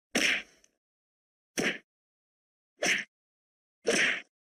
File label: four punch